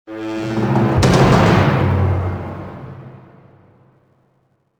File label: death music; Star Wars Jedi Knight II Jedi Outcast; XBOX